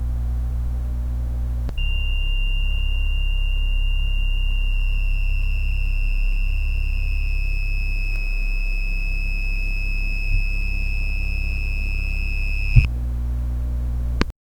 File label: low rumbles and then, alerts